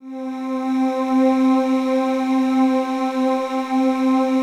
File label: PAD, DIGI-PAD